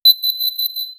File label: sound effect, task